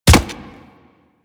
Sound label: Step Default, Footsteps; Warframe; Infested Charger